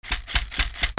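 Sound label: percussion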